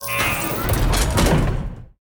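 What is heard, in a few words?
steamer gate death, monster; steamer crazy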